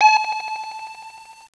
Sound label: nuke beep; weapons; svencoop